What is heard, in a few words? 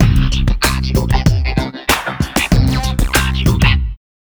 Stab; Buzz! Junior Robojam; PS2